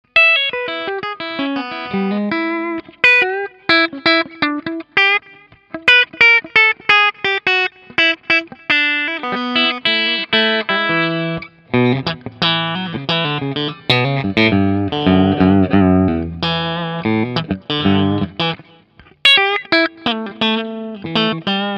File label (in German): Maybach Teleman T61 Steg clean